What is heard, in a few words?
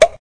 plastic hit; Weird and Interesting Hits; single hits